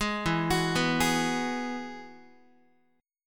E Major Add 9th, Guitar; arpeggiated; JGuitar

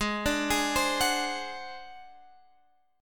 G sharp 7th Flat 5th G sharp, down

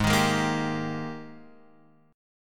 G sharp Major 7th Suspended 2nd; G#M7sus2 Chord {4 6 6 3 X 3} strummed

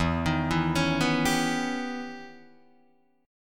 E Minor Major 13th E Guitar DADGAD; EmM13; arpeggiated

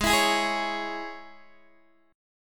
Ab Suspended 4th Sharp 5th Guitar Open D

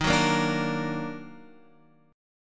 D sharp Minor Major 7th; strummed; chord; x,6,4,3,3,6

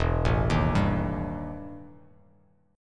E Minor Major 7th Double Flat 5th E Bass Standard; arpeggiated; JGuitar

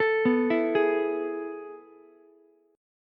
A Major 7th Suspended 2nd, Ukulele; AM7sus2 arpeggiated; JGuitar

